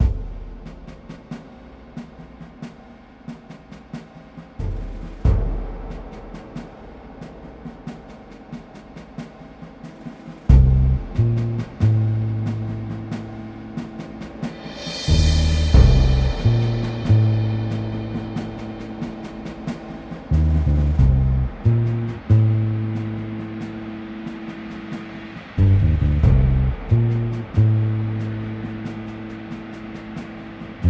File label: ambiance